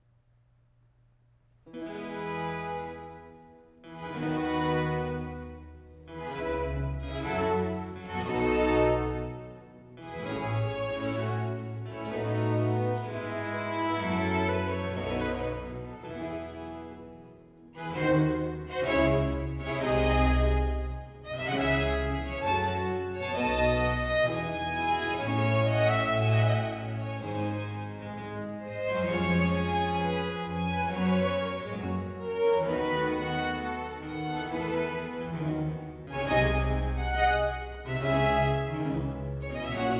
Concerto grosso Preludio (Largo); No. 9 in F Major; Corelli; Concerti grossi, Op. 6

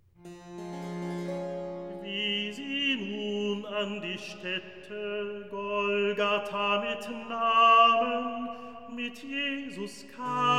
Recitativo; Wie sie nun an die Statte; Handel; Brockes Passion

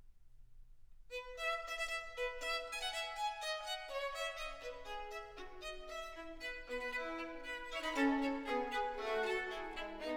Sonata in F Major, Canzona; Purcell & Blow

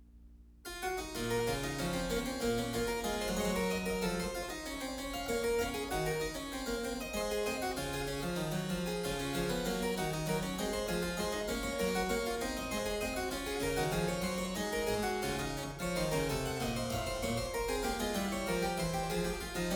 French Suite in C Minor Courante; French Suite No. 2 in C Minor, BWV 813; Bach; Anna Magdalena Bach Notebook

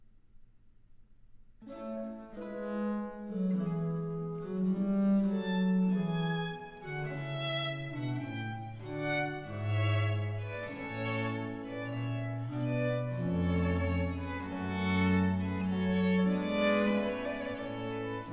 Trio Sonata in B-Flat Major, Largo - Presto; Purcell & Blow

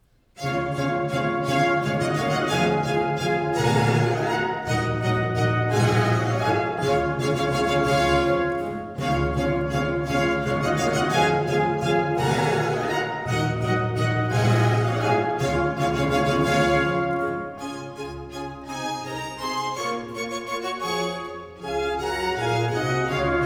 Suite in D Major Minuet; Handel; HWV 349; Water Music

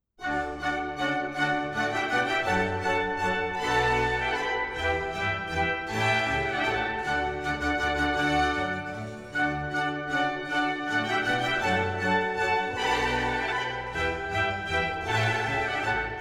Water Music Suite in D Major Minuet; Handel; Fireworks Music & Water Music

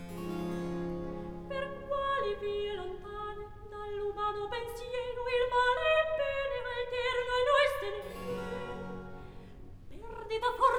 Recitativo; Act One, Scene 6; Per quali vie lontane; Floridante

